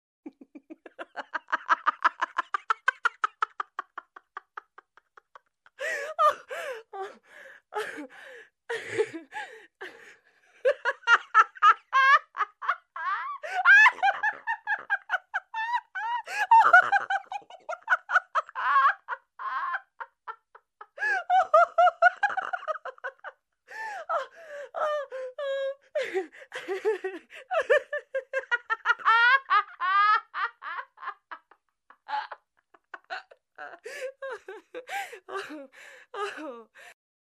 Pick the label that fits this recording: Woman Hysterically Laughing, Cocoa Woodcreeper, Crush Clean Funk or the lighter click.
Woman Hysterically Laughing